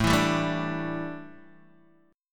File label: A 7th Suspended 4th Guitar Open D, chord